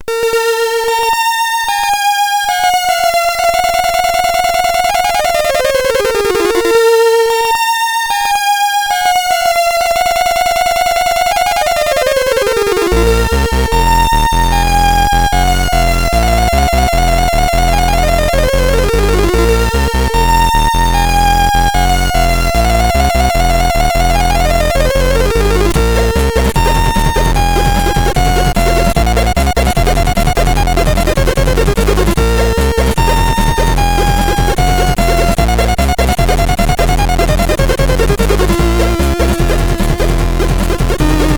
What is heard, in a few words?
Intro Tune, CSG8580R5